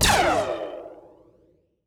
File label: fire